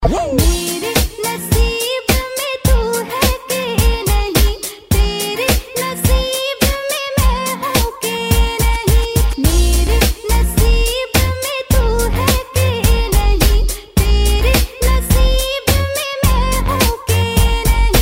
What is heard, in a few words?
sound of asia, ringtones classical